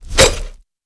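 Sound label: Lizard Man Attack; Seer; GamigoNA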